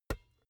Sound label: basketball catch finger tips hard